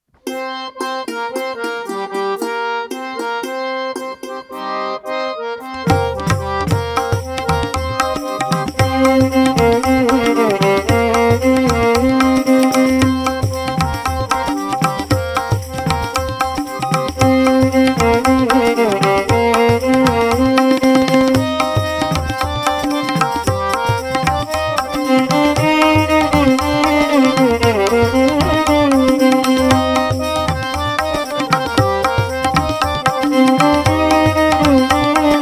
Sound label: Ladies Karaoke C Pancham